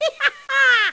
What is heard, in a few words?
slide ha; My Science Fair